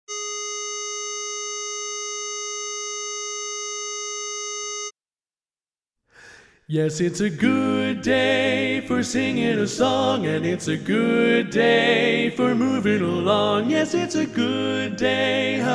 Lead

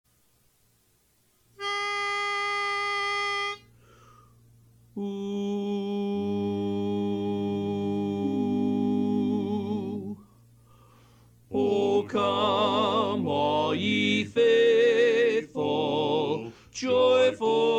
O Come All Ye Faithful Lead

